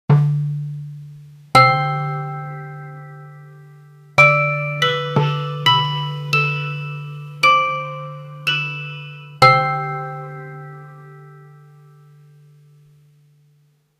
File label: film soundtrack, instrumental